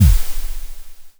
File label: boom; vine